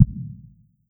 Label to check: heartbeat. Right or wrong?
Right